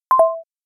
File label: vip new msg